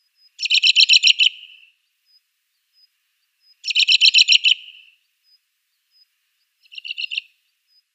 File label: American Robin calls